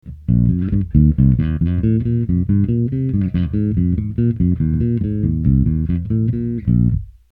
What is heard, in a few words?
bassman fingered, Bass Driver D.I.; sansamp; Bassman - Fingers